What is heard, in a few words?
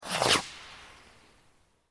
firework shoot; csgo